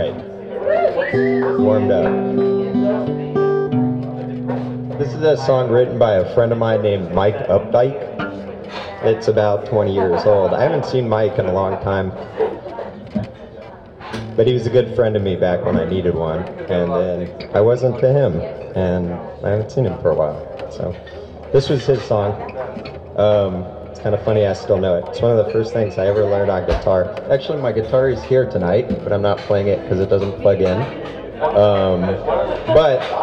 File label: Guitar Intro